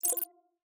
Data ending

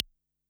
Notifications and Buttons

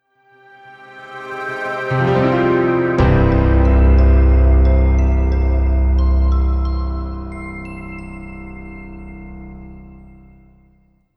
Corporate Transition

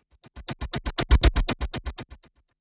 Strobe Transition

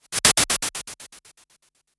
Strobe Transition

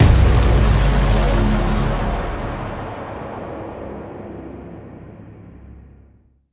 Impact

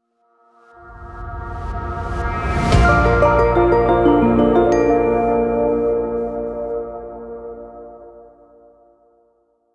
Corporate Transition

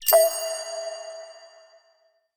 Notification

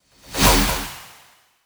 Aggressive Transition

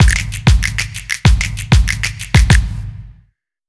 Stomp Transition

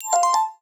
Message

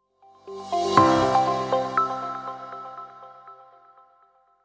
Corporate Transition

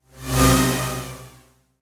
Aggressive Transition

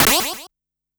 Game Sound

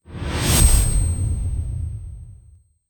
Simple Transition

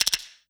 Notifications and Buttons

